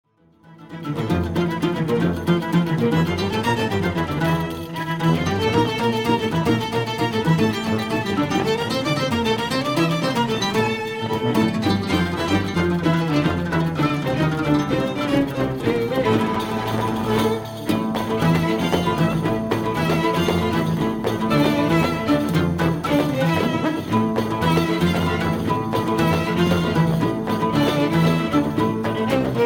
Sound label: arabian waltz